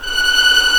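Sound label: F#6 tremolo p violin right, violins tremolo; East West; The Ultimate String Collection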